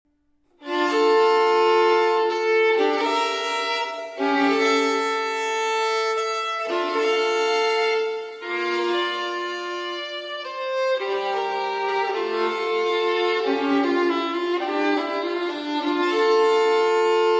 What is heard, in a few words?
Violin Partita in D Minor Ciaccona; BWV 1004 V.